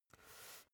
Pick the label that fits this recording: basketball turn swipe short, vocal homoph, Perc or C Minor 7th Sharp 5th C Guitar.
basketball turn swipe short